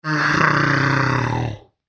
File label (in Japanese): クリーチャー ステレオ